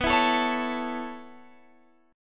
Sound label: A Major B